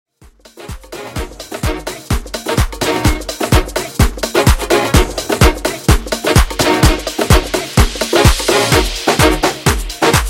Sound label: Dance Afrobeat 127Bpm